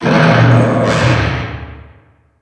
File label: alien